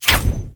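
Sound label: fire dagger, attack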